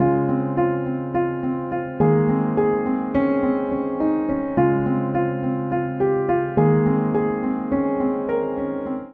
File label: Phrase Lazy Day IV-I Ab Major 105 bpm, Upright Piano; MIDI Clips; Upright Piano by Spitfire Audio; Ableton; Factory Packs